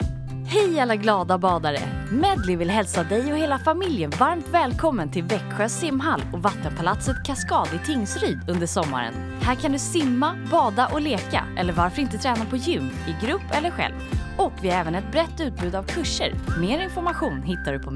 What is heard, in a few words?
Medley; växjö; tingsryd